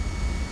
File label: Vehicle Upv Loop; Playable Tutorial Level; TR3